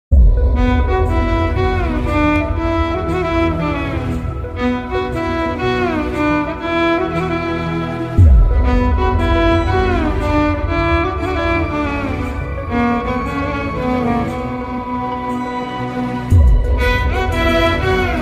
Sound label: breakup song bgm ringtone; galat shot web series